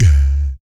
VOC PERC, Vocal Planet Jazz FX BASS PERC

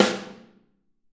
Snare OH; Drums Percussion; Snare OH All

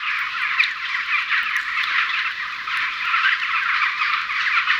Campfire Birds; outdoor; Camping

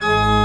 CHRCH, CHURCH ORGAN; Roland - LA Composer vol. 1

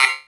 PERC 160 BPM; Ueberschall; Drum & Bass Flash Trackz

